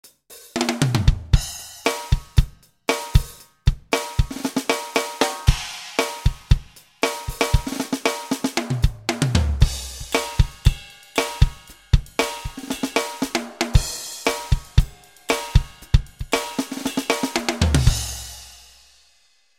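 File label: Tight Rock 1 KIT, xv-5080; SRX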